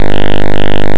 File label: engine normal, speaker pulses (~9.5 kHz); Called once per interrupt (~50 Hz); each call plays 8 speaker pulses (~9.5 kHz).; during normal speed flight (player not pressing up or down); River Raid